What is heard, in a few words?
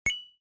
coin sound; brasil